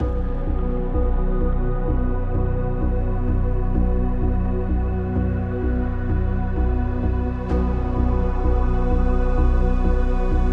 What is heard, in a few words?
Elec Ambient; Electronic Ambient Music Pack